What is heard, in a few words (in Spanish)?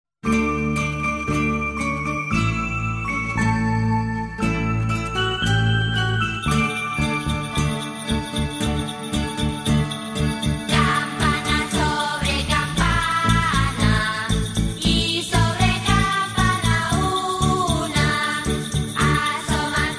CAMPANA; DETALLES DE NAVIDAD POR BELINA, FUNDACIÓN GORBEÑA